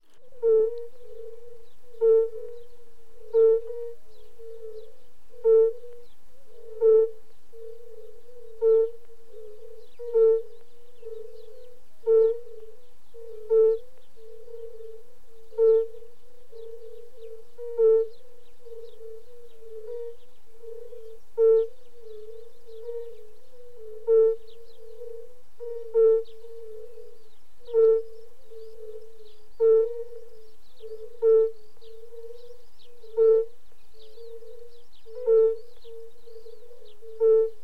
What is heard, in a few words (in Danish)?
klokkefrø